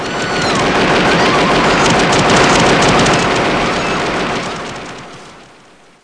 gun; Talking E-Mail

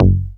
BASS SYN

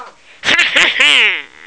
hahaha; hamster

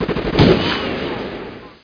city

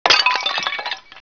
clay brk; Hexen2

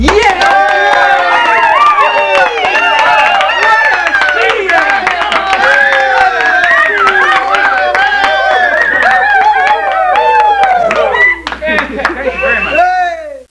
Applause Small Crowd; Human; Soundsation

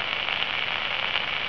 Radio Static; F⁄A-18 Hornet; F/A-18 Hornet 2.0 Demo

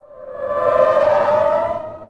fiend; kult

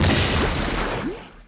ship sink; PHARAOH

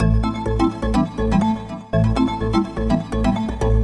SYNTH HOUSE 125 A, Keys; eJay

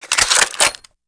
reload, srifle; weapons

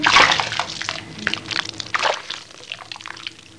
plaetsh

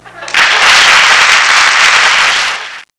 applause; Spanish Net

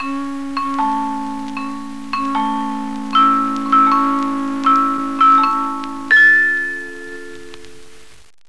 chimes; BBC

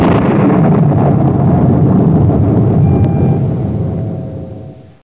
Sound Blast Off

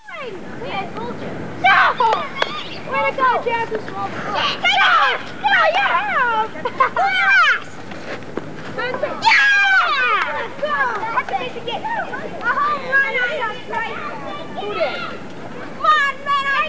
kids; Know Your Baseball - Greatest Player

